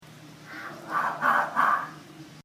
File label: Fishing cat